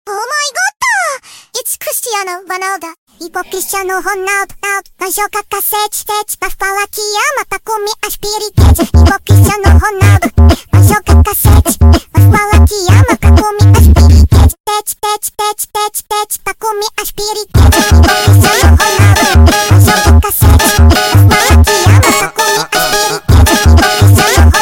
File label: funk sped Up